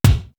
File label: TECHNO; East West - Global Sounds; D4 BD SD TOM